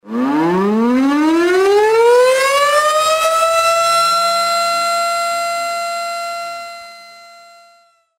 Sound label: Tornado Siren